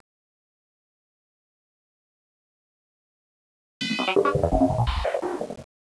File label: device